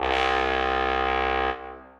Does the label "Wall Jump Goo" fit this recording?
No